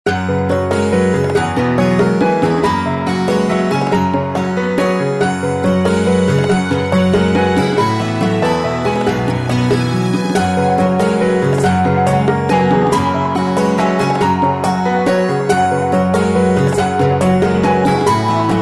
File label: Battle BGM